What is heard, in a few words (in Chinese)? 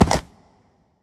骷髅马水中跳跃声